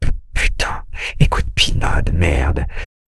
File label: jingle